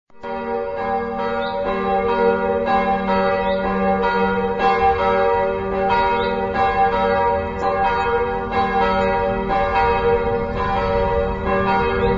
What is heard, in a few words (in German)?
glocken; st petri und pauli